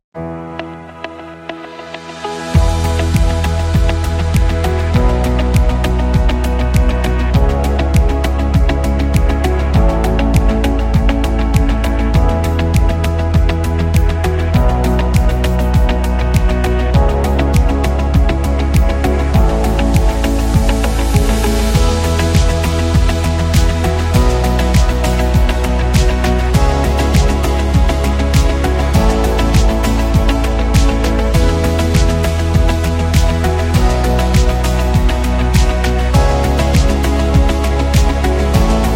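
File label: House Tour, motivational corporate music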